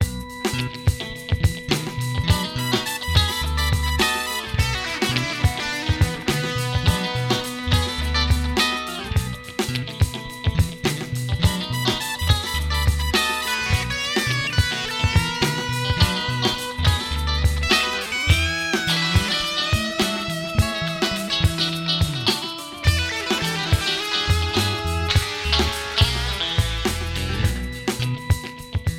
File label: Funk; Genoa Transit